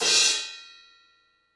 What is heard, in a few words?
Crash Hhxplosion 18 Choke